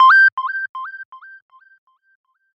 Triangle

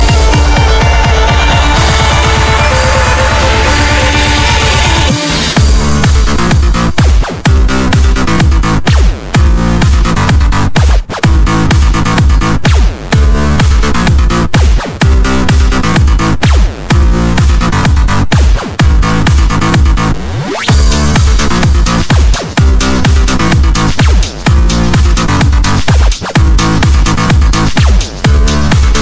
Electro

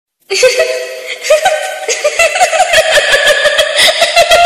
Girl Laughing

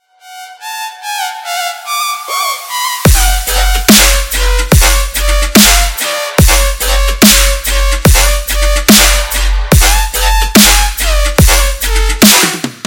basshead wobble

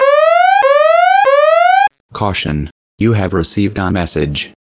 Message Tone; Avast

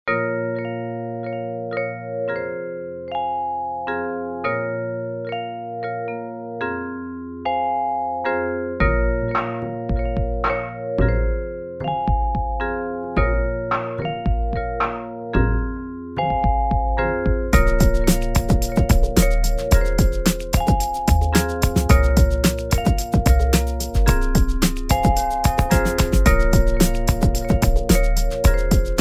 Heavenly Bells

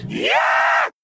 Demon Meme Sound

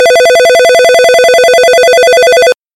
Nortel Standard Ring

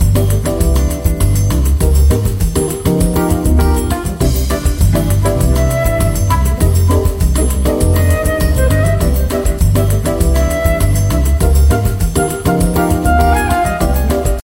Samba; Rio

